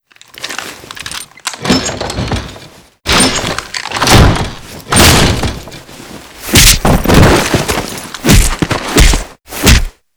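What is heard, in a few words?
interrogation enter; Modern Warfare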